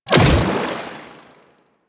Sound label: Animal Conservation Drone Spawn; Warframe; UI